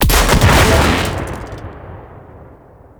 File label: Reinforce Beacon Death Explosion; Warframe; Venus; Reinforcement Beacon